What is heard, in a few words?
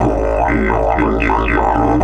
120, Didgeridoo